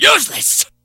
Wheel Kick

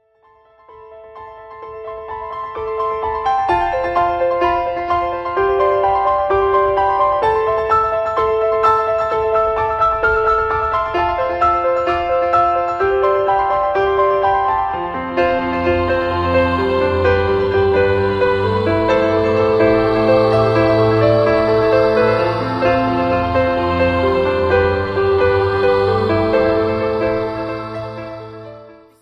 Piano Epic

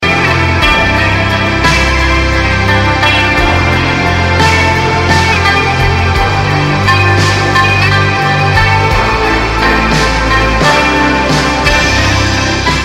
Best Sms Alert

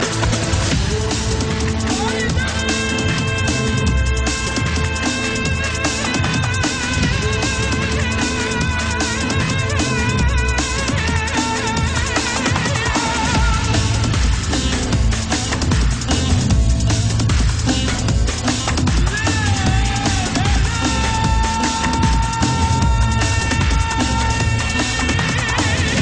Drum And Bass

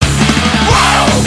WOAH

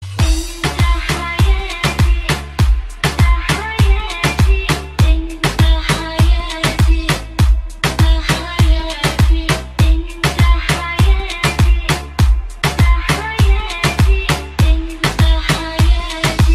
Arabic Remix

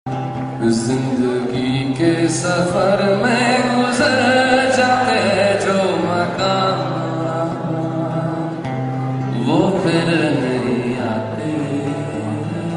Home Singing

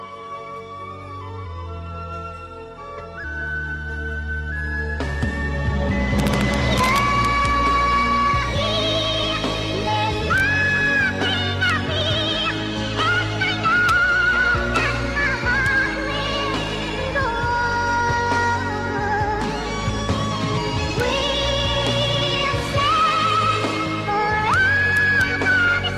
Sad Theme Song

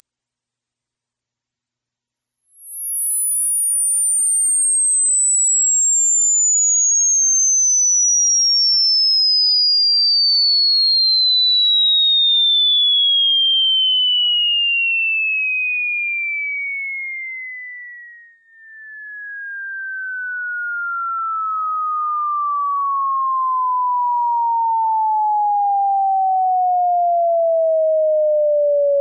riiiing

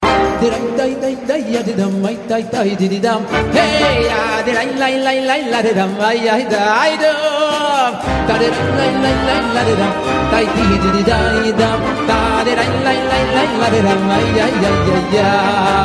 Nigun

